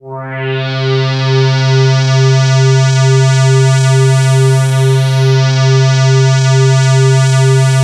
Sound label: PAD C3 R, Jungle; USB Soundscan vol.05 - Explosive Jungle; AKAI